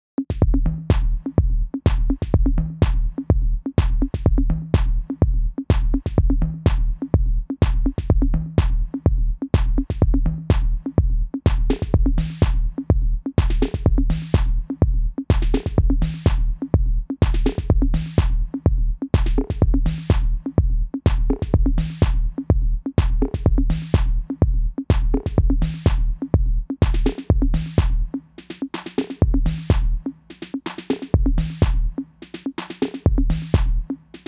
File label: BBox